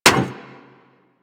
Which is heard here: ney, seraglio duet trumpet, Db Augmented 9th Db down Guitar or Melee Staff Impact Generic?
Melee Staff Impact Generic